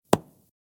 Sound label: mine tick